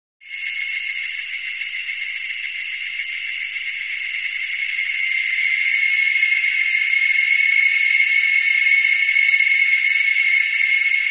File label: Frogs Heavy Rasping